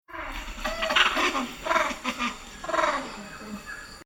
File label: Jungle Animal Monkey Several Long Tailed Macaques Shrieking Talking; Nature; Monkey Sound Effects